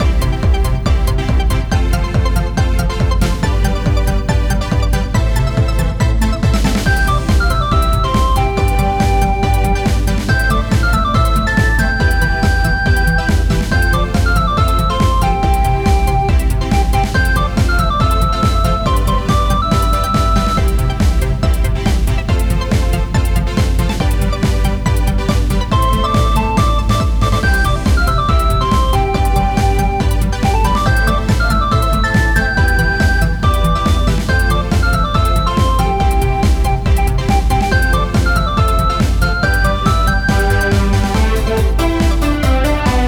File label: blossom mountain remake bpm140